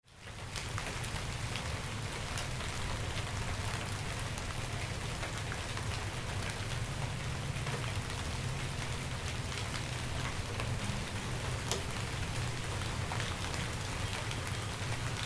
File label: Light Rain Thunder